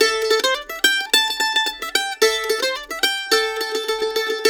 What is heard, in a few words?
Blues Guitar Samples, lick